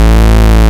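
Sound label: Juno-60 PWM; E-MU Formula 4000 Series Vol. 2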